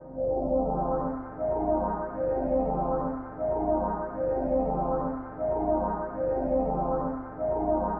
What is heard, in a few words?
120 Cm string, String Loops, Trance; Trance Explosion Vol1